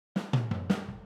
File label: Boogaloo - Overhead ST, 82 BPM; Drums; Intro; Sonic Foundry; Drums from the Big Room The Mixes